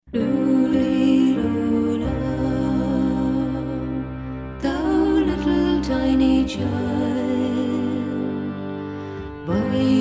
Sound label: Celtic Christmas Mix, Lully Lullay